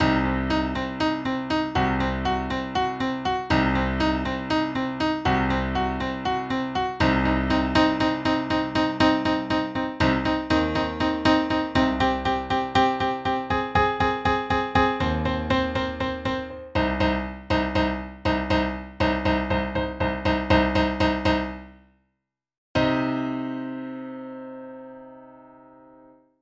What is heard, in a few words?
Piano music for a horror movie in C minor